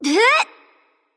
grunt lift; discordia

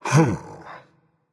sigh, berserker; cuchulainn

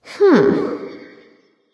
thinking light; smite; voicepack; hel

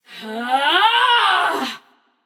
battlecry passion; chaac

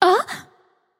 surprised light; smite; voicepack; hel

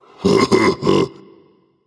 heh heh, voice; Smite; ymir skin14

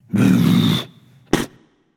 nv tongue; smite; voicepack; hachiman

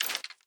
armor; god artemis; smite; artemis v3 skin15

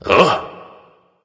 confused; smite; hachiman

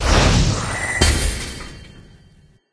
lobby landing; smite; chronos

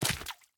minion footstep dirt; smite; npc; roman archer

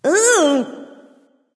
"ugh"; smite; voicepack; nike

